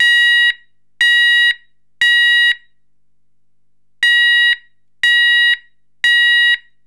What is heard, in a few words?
Sounder